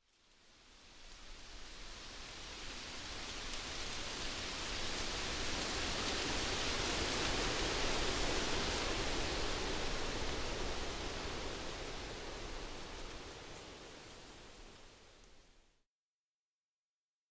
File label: Leaves blowing in the wind; Sound-makers